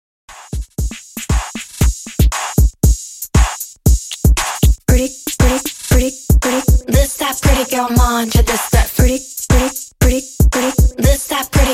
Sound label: K Pop Redrum 117 Bpm